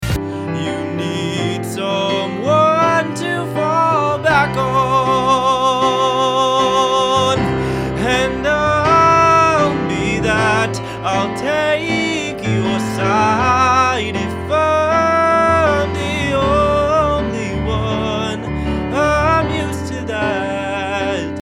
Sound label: Vocal Musical Theater; Vocal Reel